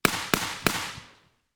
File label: Enfield L86 LSW 5.56mm right Triple shots; 1m; MKH8040